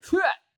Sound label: battlecries